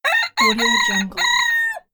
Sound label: rooster call